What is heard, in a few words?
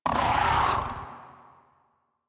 Catbrow Combat Vocal Attack Medium; Warframe